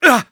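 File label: pain sharp, German; Sniper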